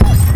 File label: Cannon Boom